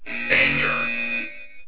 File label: blare danger